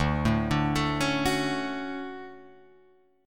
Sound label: D Major 7th, Guitar, Drop D